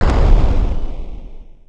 风

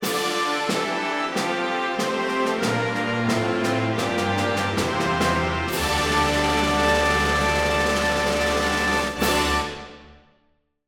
SFX, 片头音乐

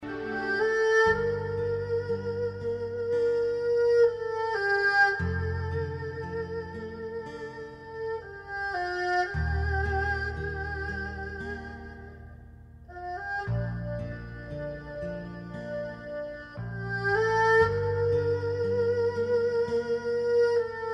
孤独, 悲伤悲壮精选背景音乐

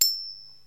硬币

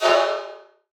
恐怖音乐

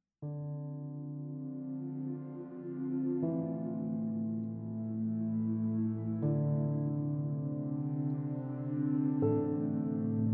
剧情叙事, 宝藏背景音乐

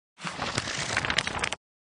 快速翻页; 快切音效

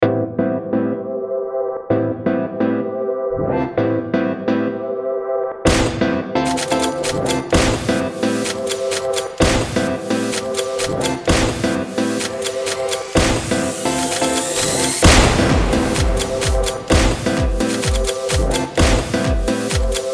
快手抖音快闪卡点枪声节奏短配乐

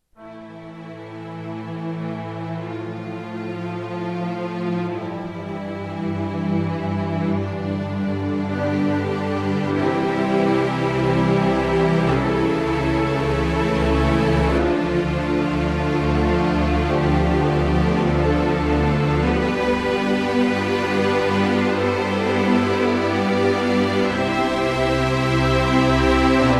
戏剧情感, 宝藏背景音乐

